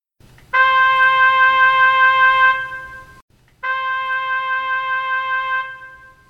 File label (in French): instrument Do 4 trompette fort puis faible; chapitre 08 Signal sonore frequence niveau sonore